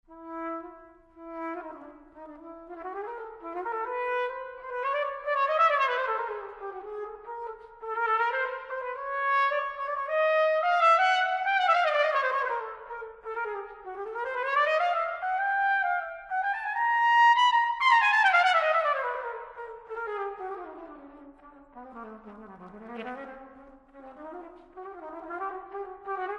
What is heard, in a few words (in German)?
solo fuer trompete in b